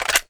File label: m14 mag in, weapons